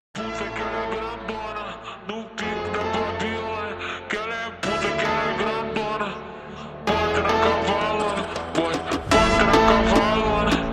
Jumpstyle super Slowed

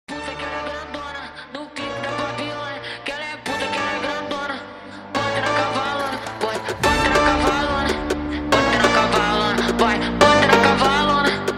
Jumpstyle sped Up

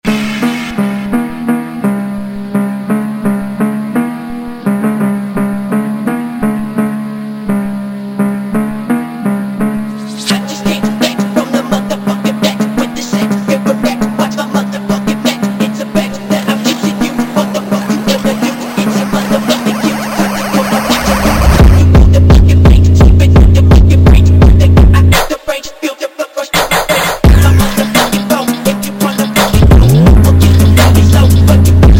Phonk; ringtone

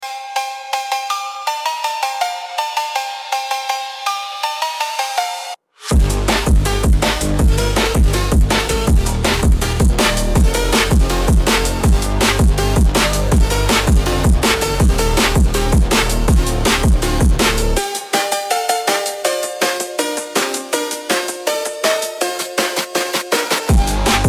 Phonk Remix; ringtone

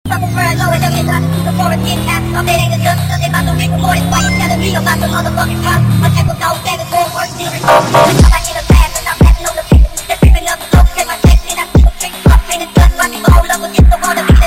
Phonk; ringtone

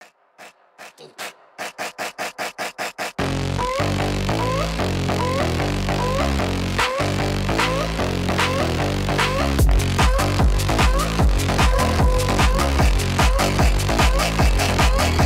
PHONK

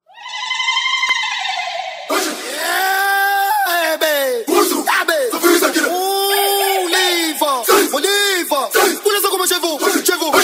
Brazilian Phonk remix

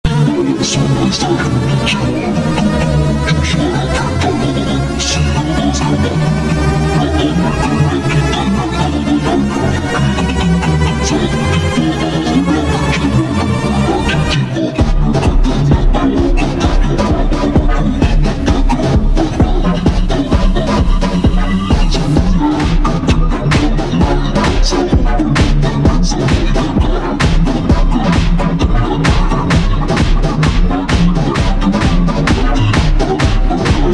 Hardbass slowed Reverb

